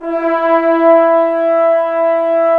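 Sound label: brass trombones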